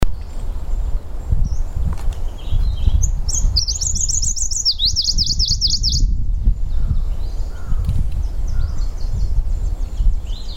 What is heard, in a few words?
warbler claudias leaf Phylloscopus claudiae